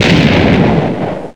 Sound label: tnt explode